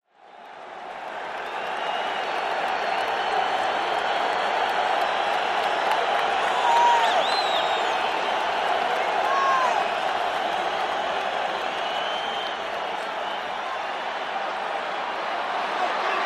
Very large crowd cheers and screams during a pro football game in an outdoor stadium.; Cheer, Crowd Crowds, Stadium Football, Crowd